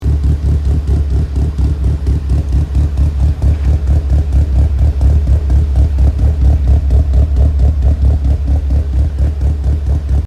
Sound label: Evo IX idle with 272L Cams; Kelford